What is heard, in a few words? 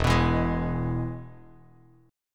E-Major E, Bass Standard; strummed; JGuitar